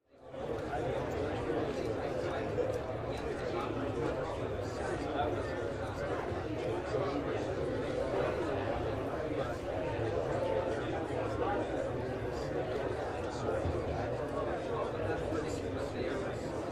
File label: Crowd in a bar talking